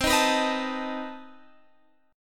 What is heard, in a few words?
C Minor Major 13th C down Guitar Open G